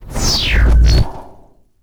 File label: Settings Sub Menu In, Transition Audio; XDK4627 BIOS and XDK Launcher and Dashboard(for Retail); ogxbox